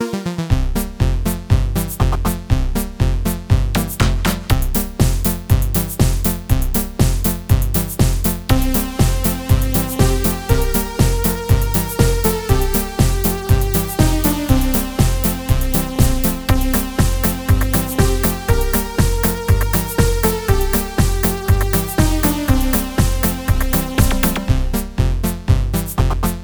Level Music; Pizza Panic

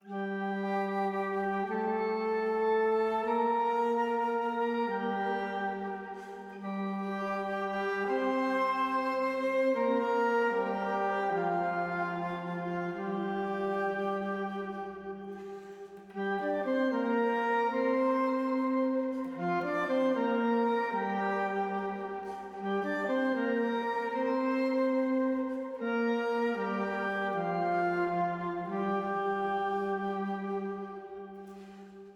Organ Version

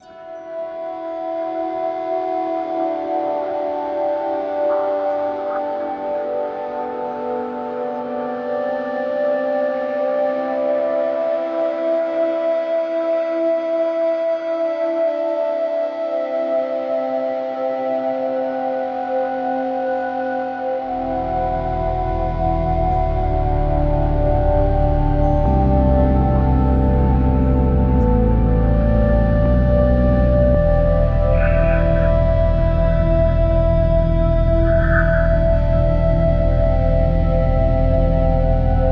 Game Start; no man's sky

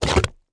gloop; GONNER

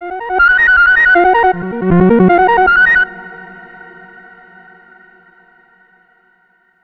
Tone

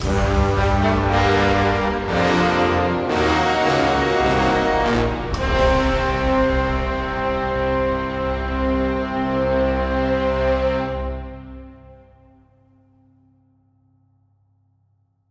Game Over Stinger

